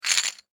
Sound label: Sports Poker Chips Slide Place Bet Clay Professional; Casinos